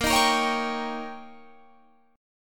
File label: D sharp 7th A sharp, guitar